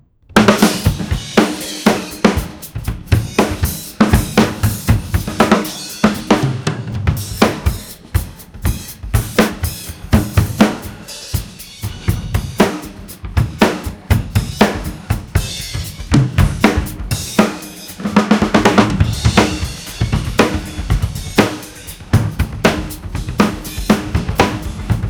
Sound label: drum solo